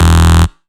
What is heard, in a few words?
Bass Shot E